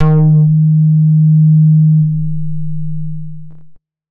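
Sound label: 90s club bass D sharp 4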